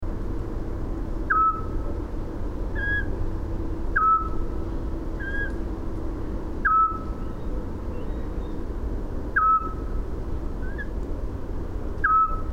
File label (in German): Zwergohreule; Landshut; Susanne Rieck